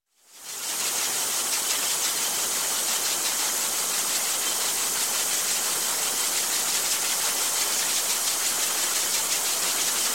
Bottling Plant Bottle Cap Machine, Factory, Industry; Sound Ideas 2000 Series